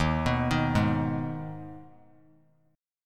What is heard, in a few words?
D sharp Suspended 4th Sharp 5th; arpeggiated; JGuitar